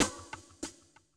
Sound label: Classic DrumLoops